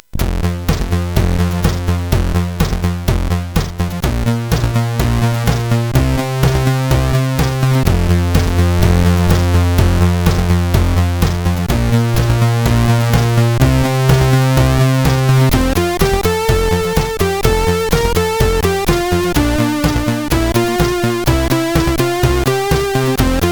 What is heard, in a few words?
Combat Zone, sid CSG8580R5